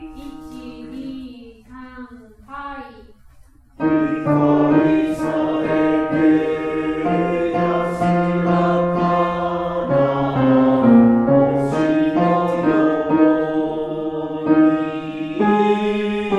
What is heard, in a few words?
akogare baritone